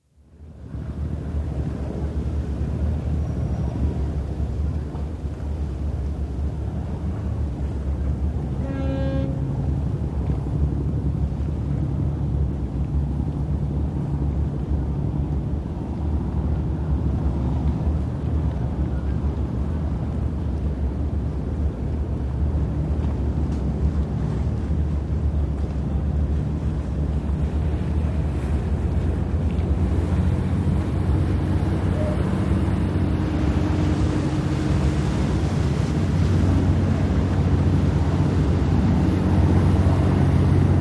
Istanbul, shoreside atmosphere, with lapping water, passing boats, distant voices and traffic skyline; Outdoor Sound Effects; BBC